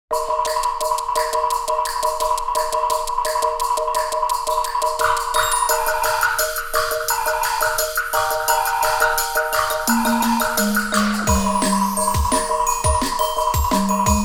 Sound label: beat of percussion